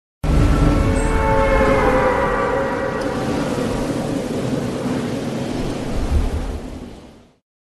Bus leaving; Fortnite

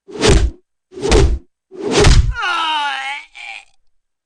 Punch

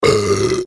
BURP

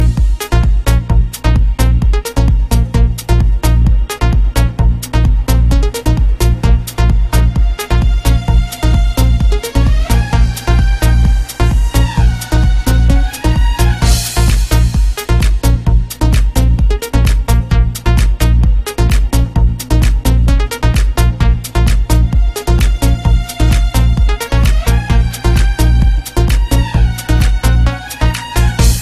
Ethno Side Pop

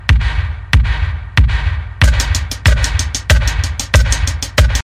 Drums Mix

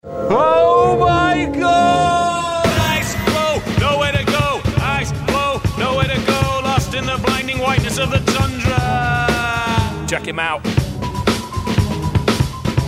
Rap

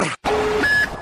Nuke

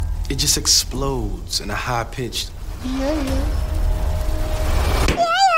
High pitched yaehyah

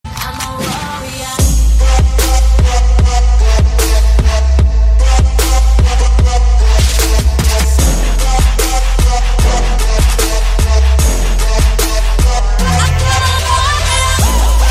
Trap

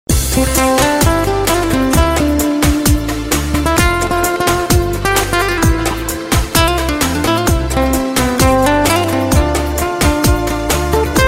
Romanian Guitar

